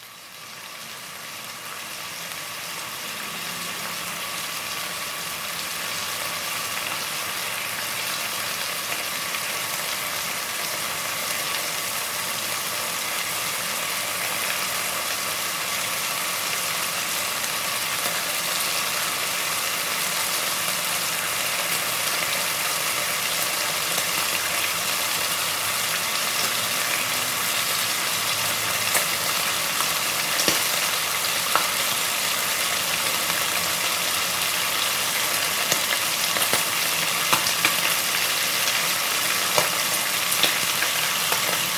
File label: Kitchen sound of frying bacon; Food and Health